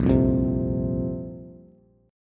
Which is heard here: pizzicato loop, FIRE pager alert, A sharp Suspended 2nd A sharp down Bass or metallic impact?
A sharp Suspended 2nd A sharp down Bass